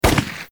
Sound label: Shoot Ak2; gamerip; blockman go